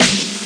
snare too much gate; single hits

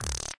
cyborg Scratchy; Cyborg Kit

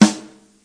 acoustic snare; single hits

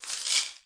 shekere, African and Eastern Percussion

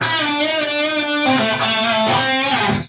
straight up guitar lick

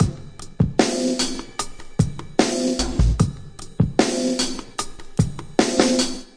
075 percusso, DRUM LOOPS and BREAKS

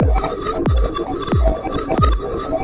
91 backwards psychedelic, drum loops and breaks; 091 to 100 bpm

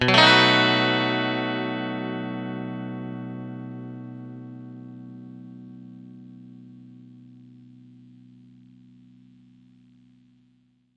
clean chord B7, clean electric guitar chords

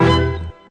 mellow orch hit; Punches Hits Discoblasts; single hits

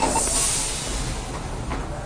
decompression; Various Strange Sounds and Effects

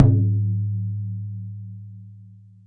okedo, Japanese Drums; ETHNIC and WORLD PERCUSSION

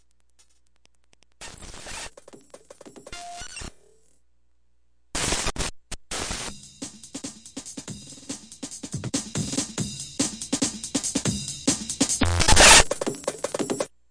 crashes and squeals, Electro and Synthetic

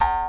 jawharp E2; WORLD

